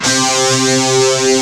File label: Barchord, GUIT; Best Service Gigapack 2